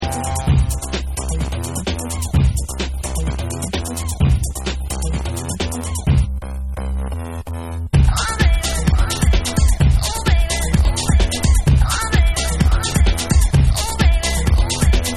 Acid Banger Dub

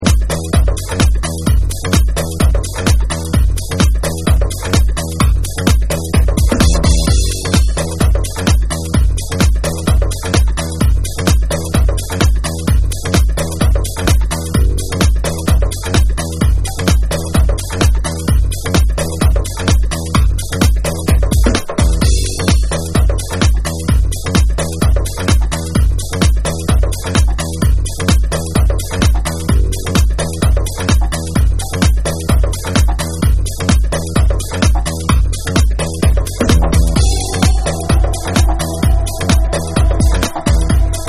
Bassic Dub

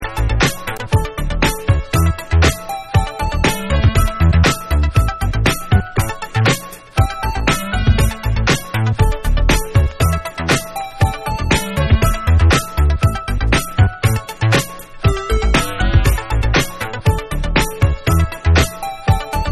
Dub

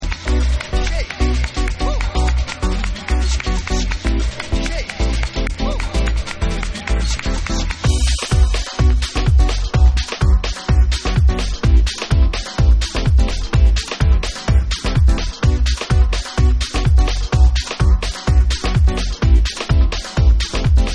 SAMBA SOUL SHAKE Samba Soul Mix

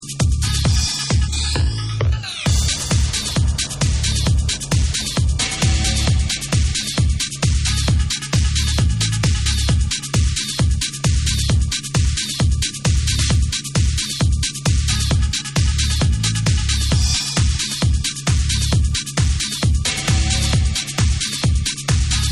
Dub

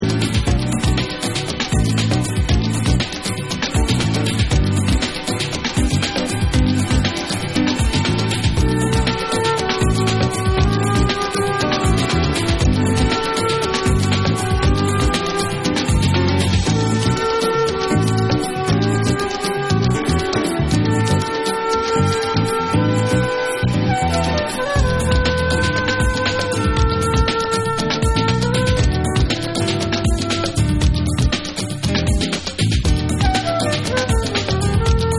CONGO Flute

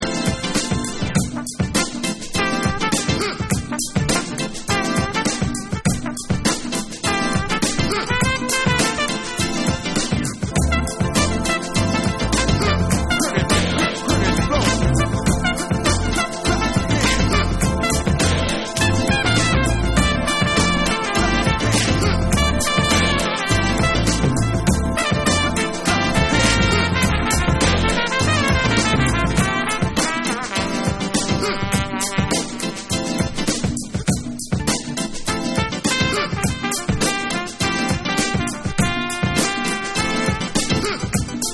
Trumpet Version

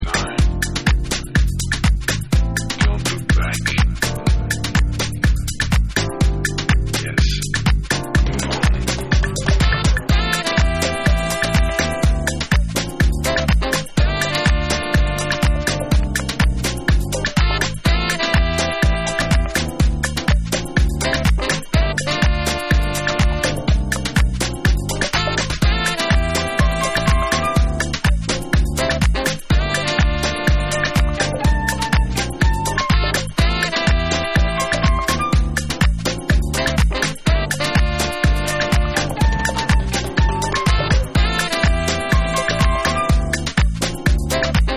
Deep Bossa Dub

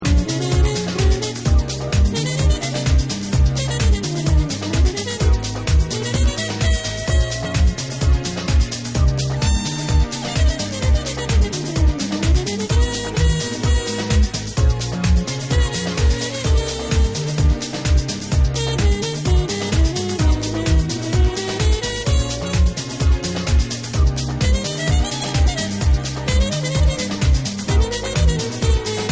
Trumpet Mix

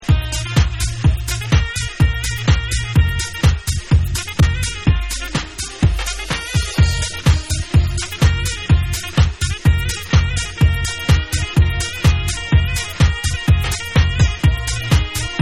ROBOT JAZZ

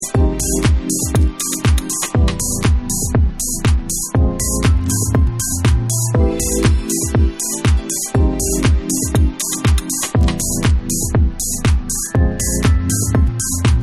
Instrumental Dub

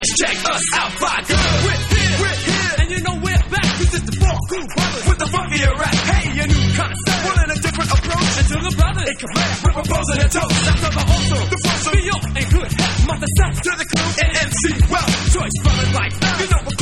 Monster Beat, hip hop electro; hip hop electro 11

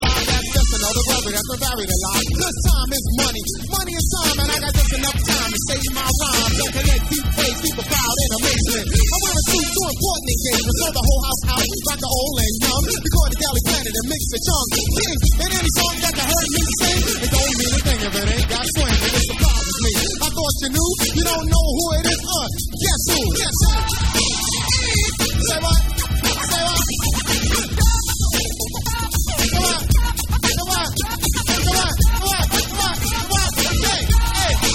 Hip Hop Vocal

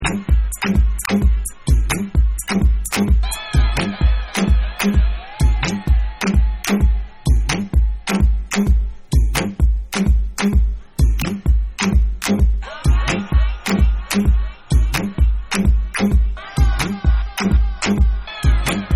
Dub

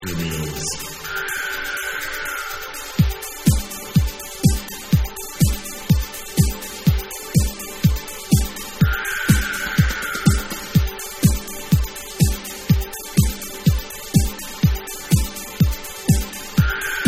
Ambient Beats

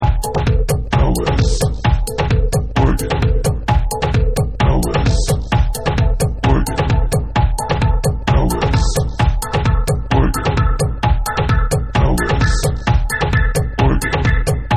ORGAN Dusty Dark Tribal Mix

